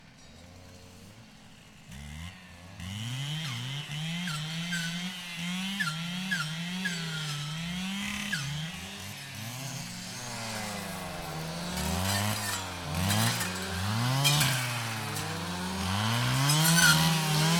brushcutters engine different machines working distant; mono; SoundHolder